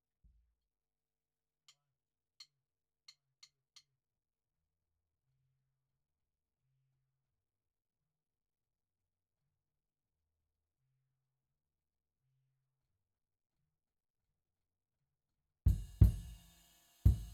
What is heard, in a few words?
Kick IN